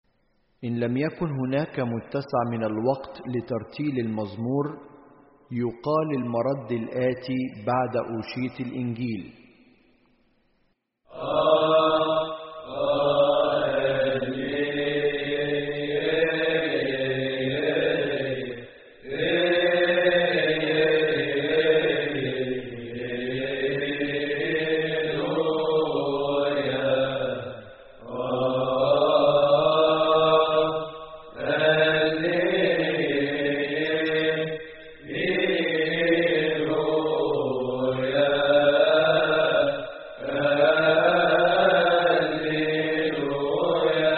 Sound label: Alleluia الليلويا